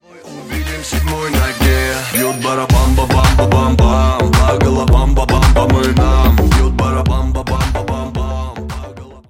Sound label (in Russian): baraban; jb engine; round sound